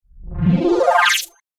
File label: Computer Games Sine Bubble Up Scifi Future Interface; Tom Hutchings